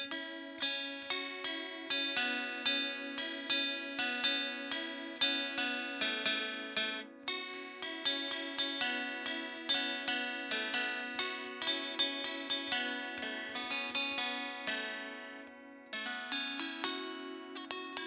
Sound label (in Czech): kantela dlabana petistrunna pentatonicka